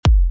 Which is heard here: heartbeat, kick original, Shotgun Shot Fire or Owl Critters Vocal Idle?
kick original